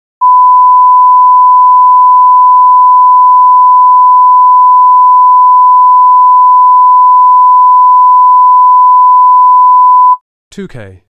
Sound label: 1KHz; Samsung SGH-i620